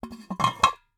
Cookie Jar, ceramic, glazed, irregular shape, hollow base, hollow top, rough, resonant, tonal, close; fast; Eiravaein Works; Vaeyan IV